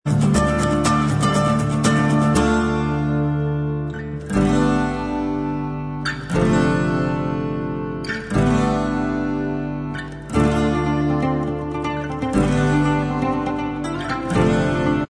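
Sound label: acoustic instrumental track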